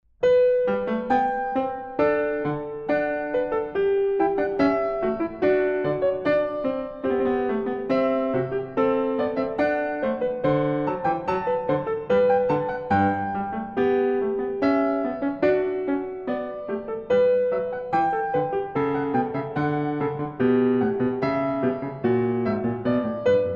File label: Piano Sonata In A Major